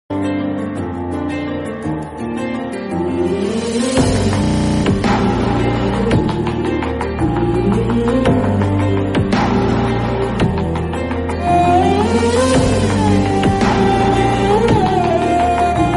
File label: tamil love bgm ringtone; chitra lahri; south love bgm ringtone